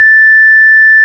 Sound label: ORG, Farfisa; Roland LCDP09 Keys of the 60s and 70s 1; Farfisa Flts; Farflt DBars